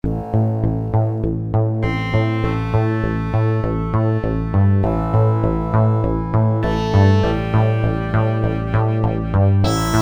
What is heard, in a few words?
transwave, ensoniq asr 10